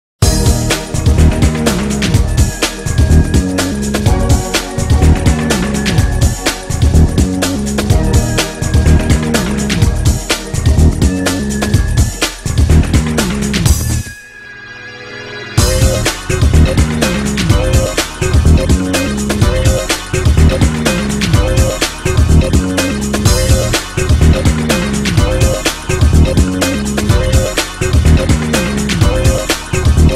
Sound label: Sewer